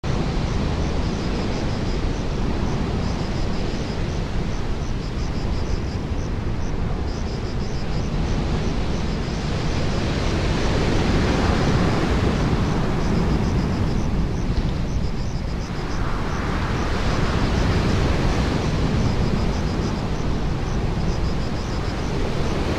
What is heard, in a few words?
Amb Cliff